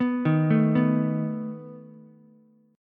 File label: A Suspended 2nd Flat 5th A down Ukulele; Asus2b5 arpeggiated